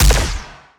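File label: Laser gun Fire; Hyperion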